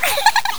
bump; quake2; hellogirl

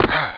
fall; quake2; snork

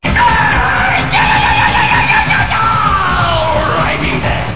death; quake2; moobull

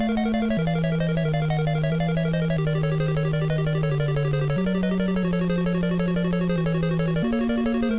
smb1 castle; quake2; mario

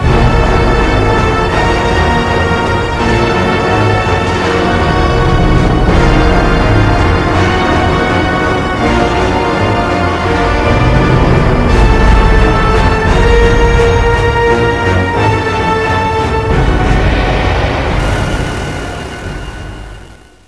sauron fanfare; quake2; fox

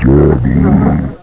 pain; quake2; ranger

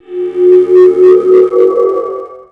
drown; quake2; bauul

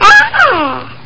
jump; quake2; babyboom

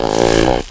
gurp; quake2; sorb

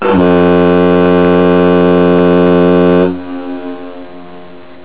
horn; quake2; karr

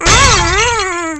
fall; quake2; lemming

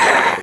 gurp; quake2; slith

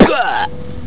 fall; quake2; postal

